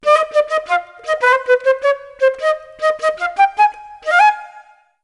Parl Music

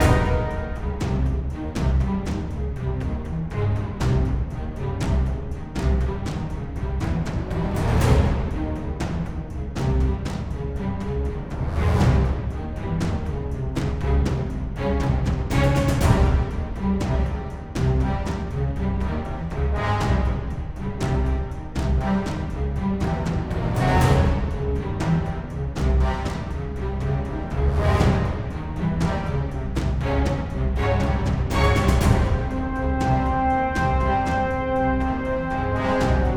demon loop; Dark Realm Queen of Flames

